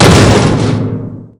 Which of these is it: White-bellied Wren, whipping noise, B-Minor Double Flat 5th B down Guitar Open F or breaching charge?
breaching charge